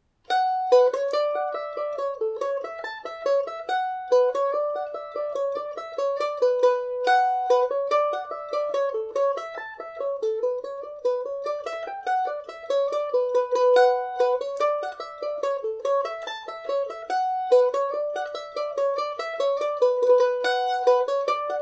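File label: B minor, Irish mandolin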